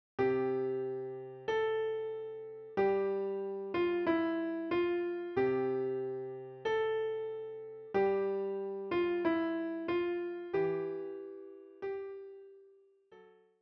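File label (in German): O du fröhliche, Klavier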